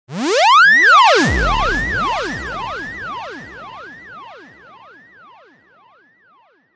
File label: deep echo zwoop bend fx; Computery or Science Fiction